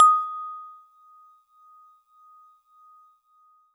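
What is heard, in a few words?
Celeste DX100 D#1; Pristine Celeste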